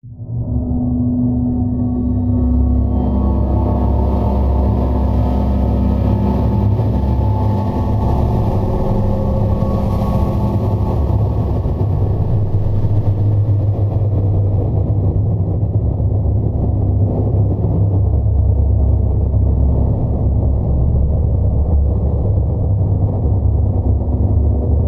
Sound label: suspense; DayZ-Epoch; dayz sfx